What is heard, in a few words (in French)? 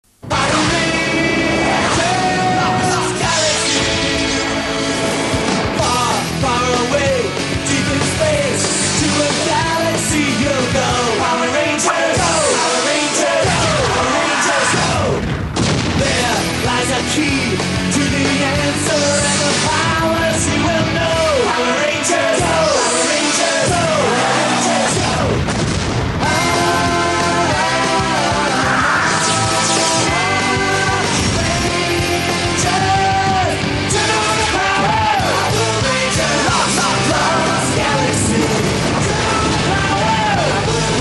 musique generique